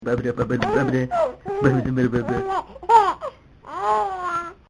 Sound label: laughing